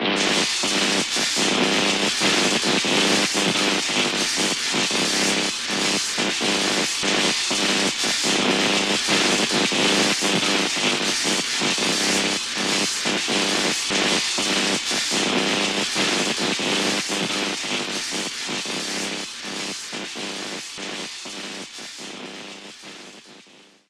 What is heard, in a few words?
Expansionball Zap Loop; disney star smash android ios mobile gamerip 2020